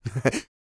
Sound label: LAUGH, Vocal Jazz Fx